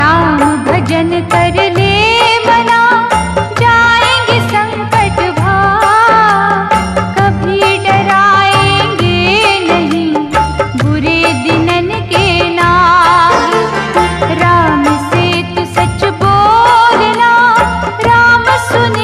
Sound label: Ram Bhajan